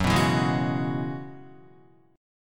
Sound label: F sharp 9th Suspended 4th F sharp Guitar; F#9sus4 Chord; strummed; 1,2,4,1,1,2